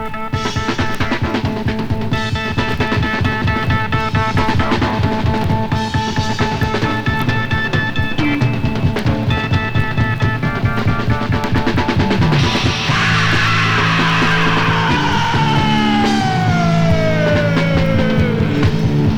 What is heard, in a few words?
Drum Solo; Philadelphia, PA; December 4, 1973